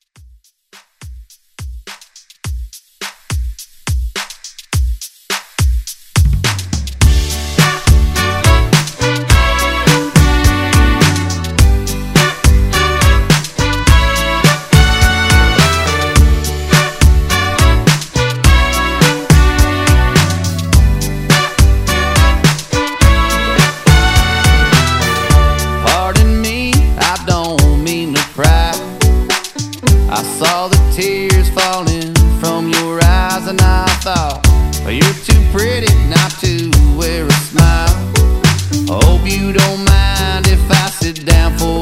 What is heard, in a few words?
Country Redrum